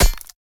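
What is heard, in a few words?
pickaxe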